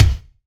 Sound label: BD, BASS DRUMS; EdgeSounds